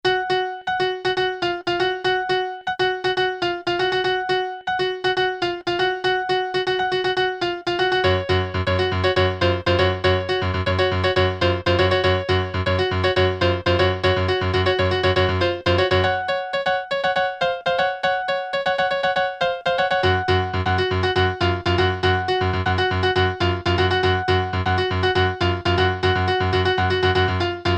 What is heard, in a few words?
MIDI